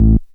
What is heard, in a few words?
tone